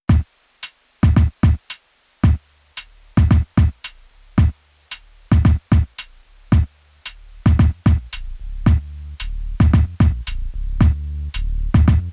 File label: midi jam